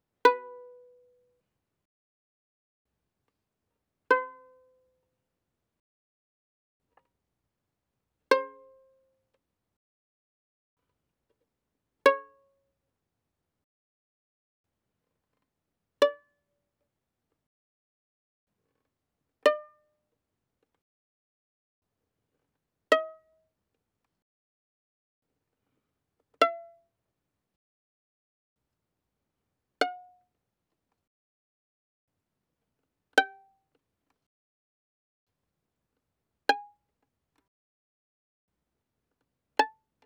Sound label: Violin pizz ff sul A B4 A5